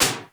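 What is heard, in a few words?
electronic drums; Crypton - DrumBase5000; ELEC.DRUMS#3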